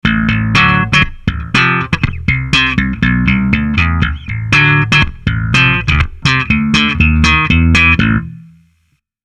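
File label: Fame Baphomet Gothic, guitar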